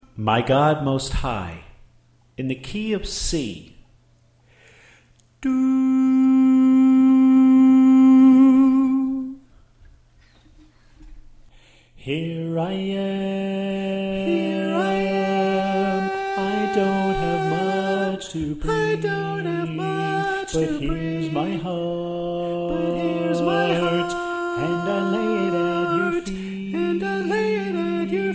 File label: Tenor Left